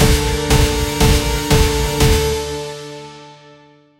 loopable epic; 4 second; slot game

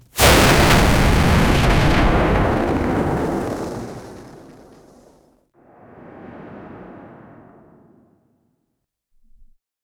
high speed rock projectil; projectiles

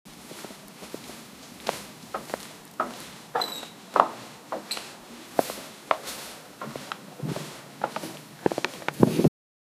footsteps on dusty village

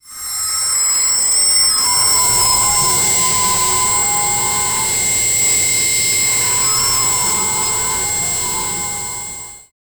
plasma; cyberpunk 2077

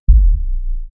light on dark; sfx low

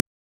deck full rejection sound, negative feedback

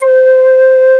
single pure, single note

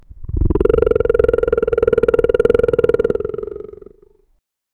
low pitched creature call; animal noise

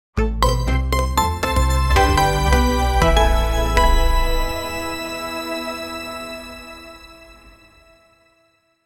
short logo sound inspirat

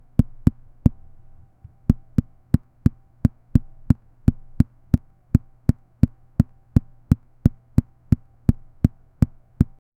im making a cartoony game, keyboard

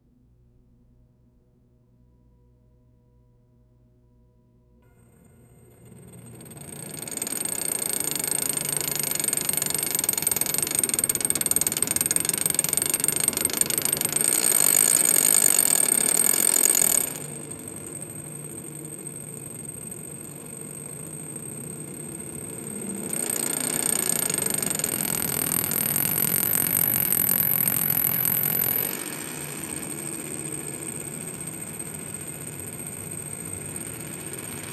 a game show wheel is

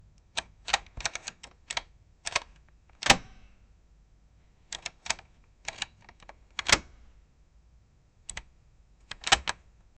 mechanical lock unlocking

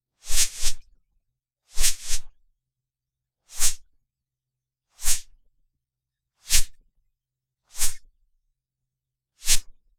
sliding down, friction; roblox

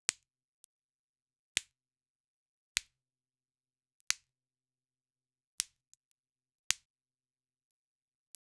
cracking a wax seal bone

